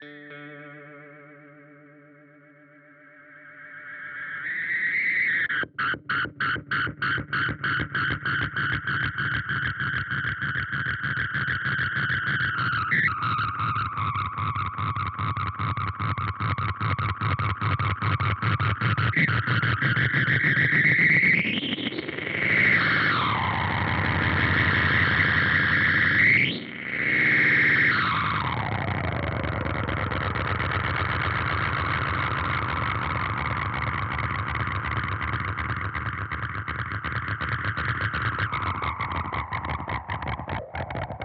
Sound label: Way Huge Supa Puss Oszillation